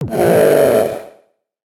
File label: ogre pain